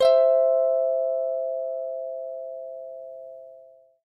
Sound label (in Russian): gitarnie melodii struna d s garmonajzerom